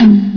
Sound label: sc55 tom; tumbledemerald